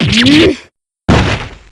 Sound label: fall